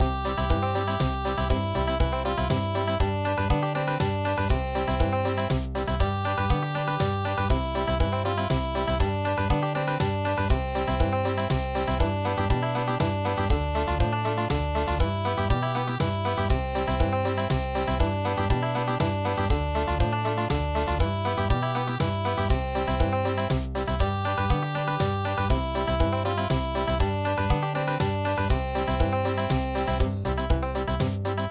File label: Dance Country; midi; traditional